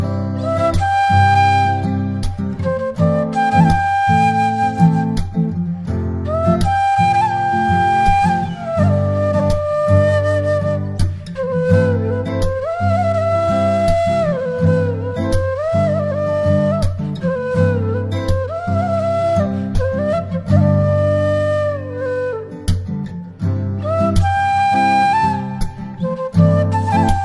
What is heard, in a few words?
Ringtone Instrumental Flute Music